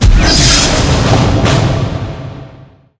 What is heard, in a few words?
spell athletic; halloween; video games; source engine; tf